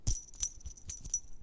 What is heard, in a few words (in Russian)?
s kotom igrayut zvenyashej igrushkoj